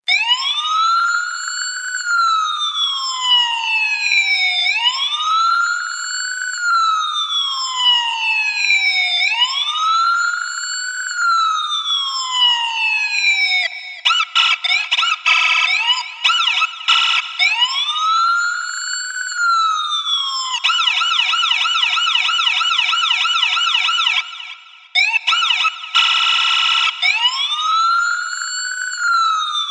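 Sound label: Police siren with echo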